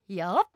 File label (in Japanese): 攻撃